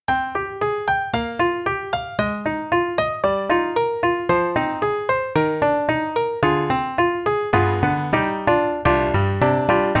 PEARL CONCERT GRAND, Staccato Dance; IMPACT SOUNDWORKS

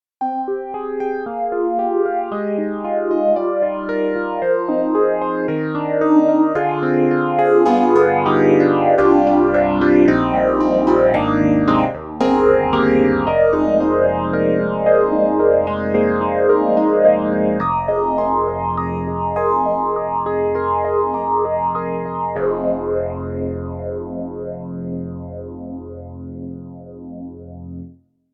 Yamaha CP80 Phaser Mono Phaser; Steinberg; The Grand 3

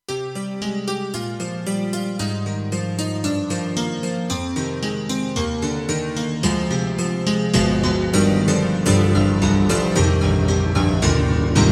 Dance Mix Piano; KORG TR-Rack

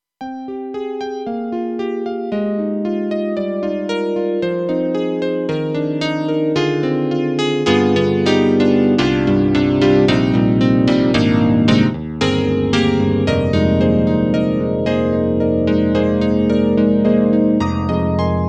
KORG M3 XPanded Pickup E.Grand Piano

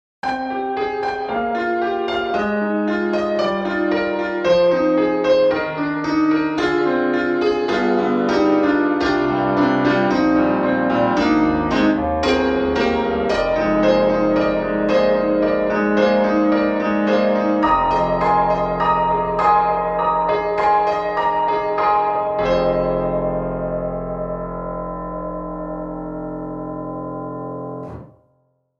Grand Rhapsody Piano, Old Upright; Waves

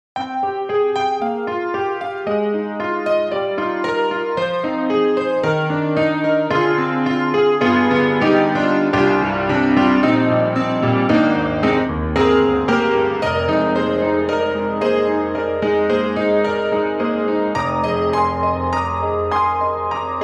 Grand Rhapsody Piano, Chorus for the Verse; Waves

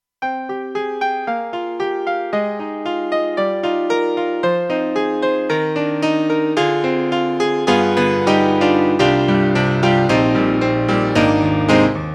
Piano; Roland; SC-8850

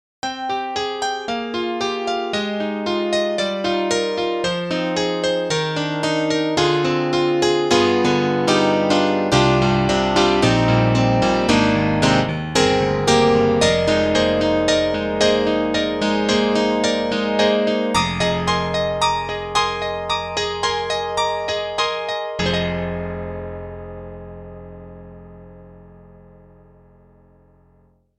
Brite Rock Piano; Roland Juno-Gi

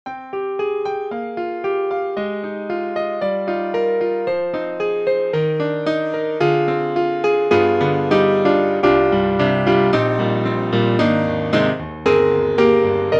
Concert Grand; New York; Native Instruments; Pop me!

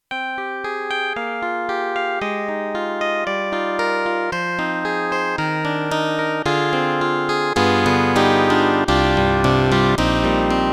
Yamaha MU1000EX Syn Clavi

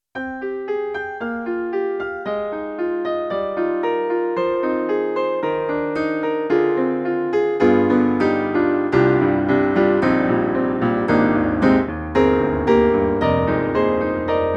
Korg SV-1 Piano Pad

